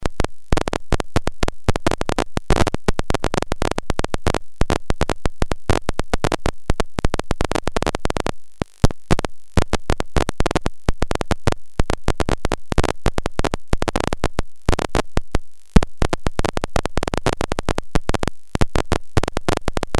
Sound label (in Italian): Fondo Ambientale